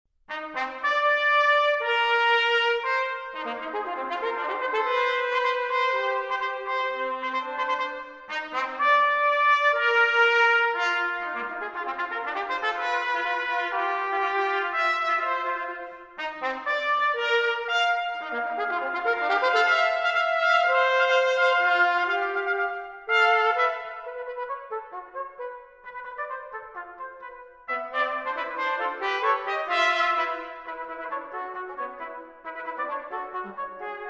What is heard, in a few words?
Capriccio Two Trumpets